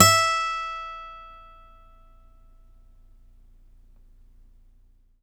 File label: STEEL F E4, Synclavier, GUITARS; Synclavier Sampler Library